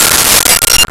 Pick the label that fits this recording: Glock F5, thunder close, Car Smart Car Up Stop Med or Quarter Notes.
Glock F5